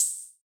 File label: HH, Yamaha DJX 2B